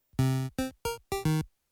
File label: Fireworks Ending; Prototype